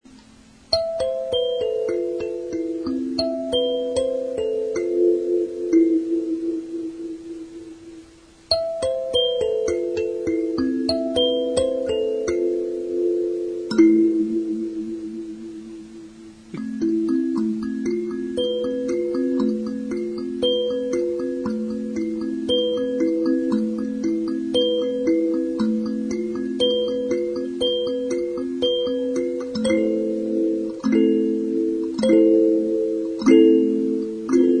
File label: sansula